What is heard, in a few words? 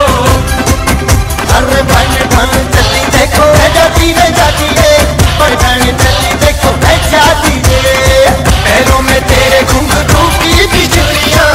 Fast Hindi Ringtone